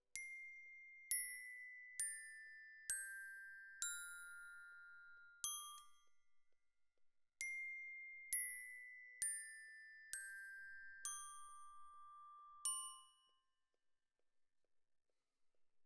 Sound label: Glock Close